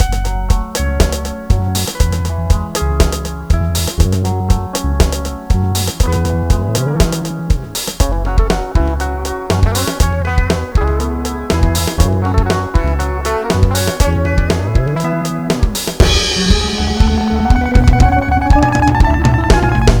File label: grunge loop